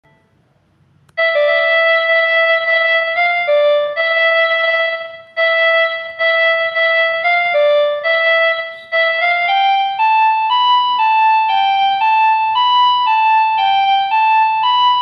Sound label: song flute bgm easy piano